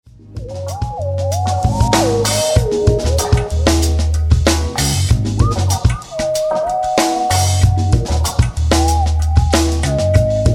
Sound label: ELECTRONICA